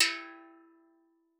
E3, STEELDRUMS; Xsample VOL-4

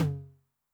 MOD Noise, 909mod MID TOM; classic machines; MT - MID TOM

